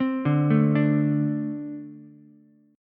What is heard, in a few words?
A-Minor Double Flat 5th A Ukulele; arpeggiated; JGuitar